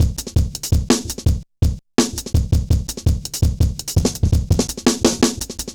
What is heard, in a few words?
Jazz Shuffle, Drum Loops; Jungle; 166.5; Zero-G Jungle Warfare 1, 2 & 3; 90 s Sample CDs